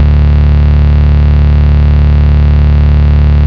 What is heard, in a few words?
Bass D, Root D